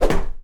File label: Kung Fu Punch